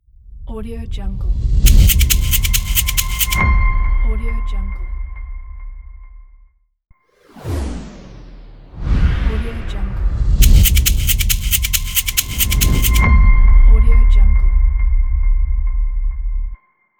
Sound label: elegant shaker distinctive logo